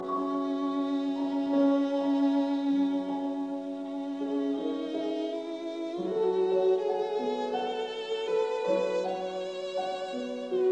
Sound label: Violin Sonata, Andante; Porter; Louis Kaufman